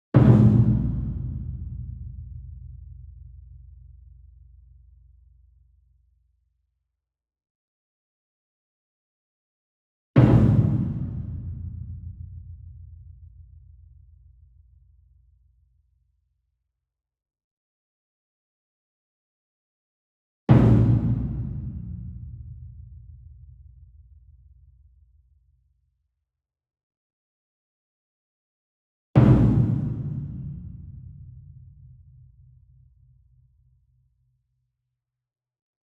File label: SINGLE DRUM Gong Double Soft Beater Far; boom library; Cinematic Strikes Construction Kit